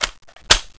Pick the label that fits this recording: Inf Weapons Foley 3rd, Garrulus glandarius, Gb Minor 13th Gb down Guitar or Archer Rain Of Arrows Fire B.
Inf Weapons Foley 3rd